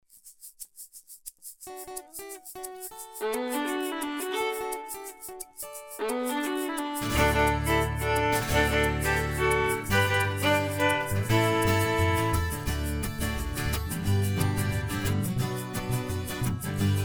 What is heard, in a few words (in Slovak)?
vcelarska solo